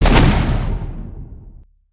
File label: charge